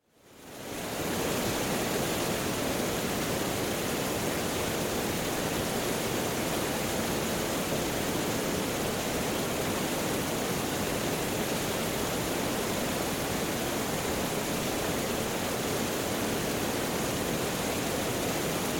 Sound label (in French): barrage exterieur